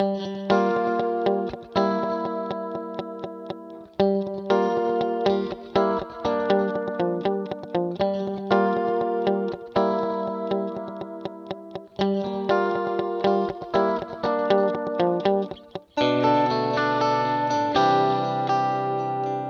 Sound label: Guitar Yamaha D5000 Mod Delay; Vintage Digital